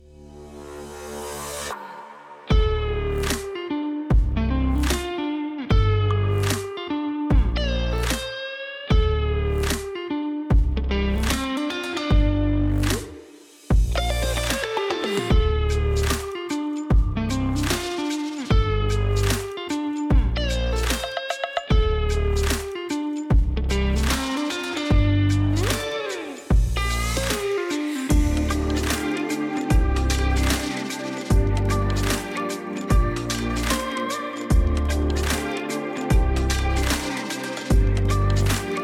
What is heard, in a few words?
music